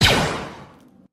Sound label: blaster; stormtrooper